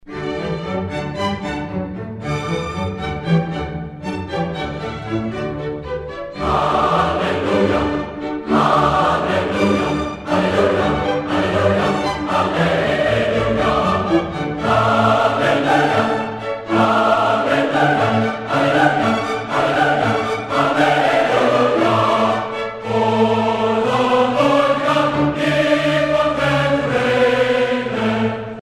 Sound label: Hallelujah Chorus